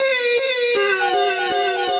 Transformed Voices; Nostalgic Mix Tape; Voice Box; Chromatic Voices

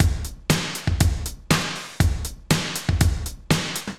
Kit, Drums; Ableton; Singularities; Neon Midnight Kit

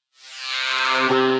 Ambient & Evolving; Ableton; Glitch and Wash

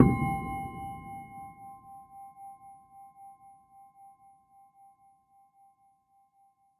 Misc Debris, Fleamarket Percussion; Ableton; Lost and Found

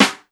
Snare; Ableton Foundation FreePacks; Foundation Drums

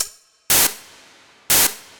IDM Perc, IDM Percussion; Ableton; Factory Packs; The Forge by Hecq

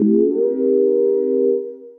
Neo Soul Pad; Synth Pad; Ableton; Beat Tools

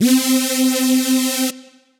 big lead stab, synth lead; Ableton; Factory Packs; Beat Tools

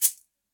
SHAKER; Ableton; Factory Packs; Drum Booth

